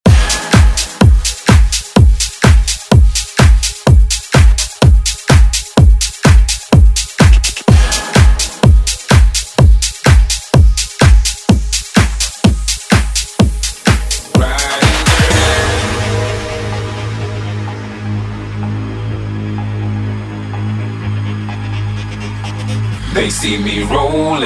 Dance Redrum

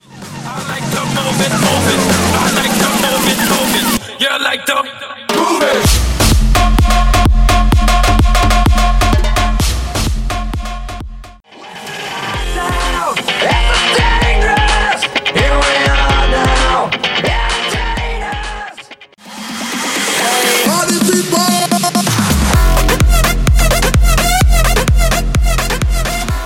Festival Bootleg Mix 128 Clean

